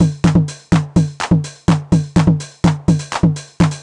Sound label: drum top 125, Classic Chicago House Drum Loops